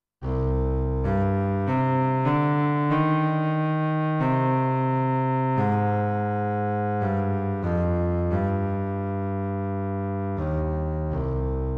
Cello

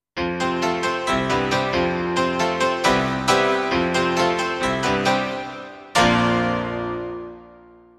Cimbalom

